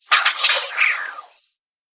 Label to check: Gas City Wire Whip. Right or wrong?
Right